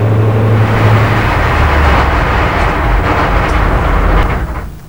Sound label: shutdown, Train; OpenBVE; 55022 Royal Scots Grey